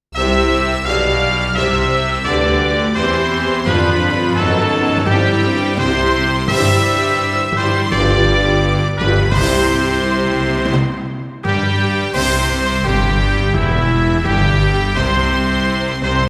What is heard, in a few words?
brass band C D; ANTHEMS